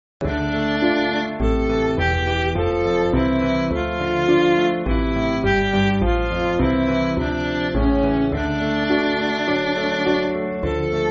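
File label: Midi, Backing Files for Churches; 3/C Chord Sheet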